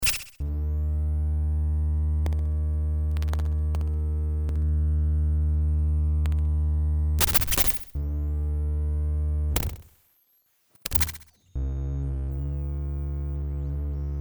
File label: humming; ciat-lonbarde; fyrall